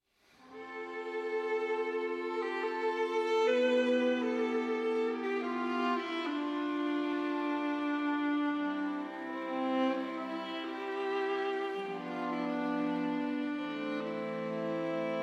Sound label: string quartet; III. quand l'aubespine fleurit